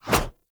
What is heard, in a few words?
Battle Dog Paw Swipe; Combat